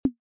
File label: boop